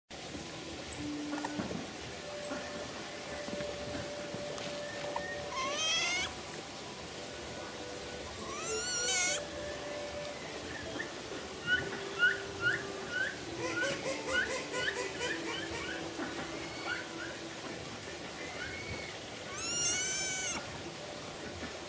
capybara

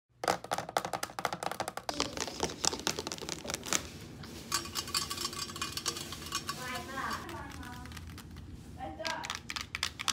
ASMR long nails

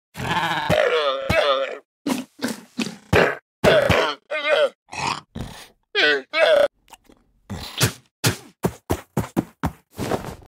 Minecraft llama

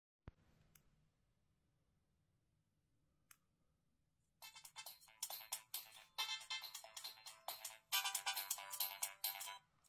Siemens

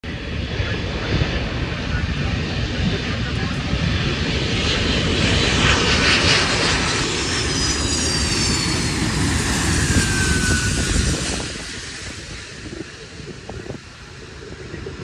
Antonov-An-124, آنتونوف 124

